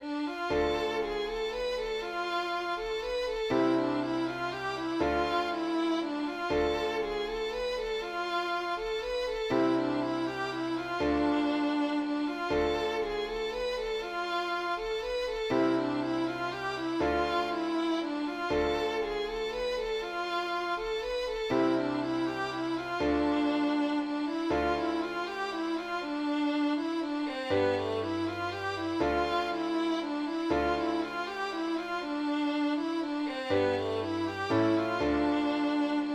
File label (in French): mazurka